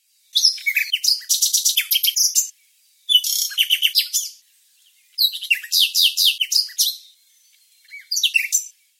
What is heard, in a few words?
answer ur phone bird; animals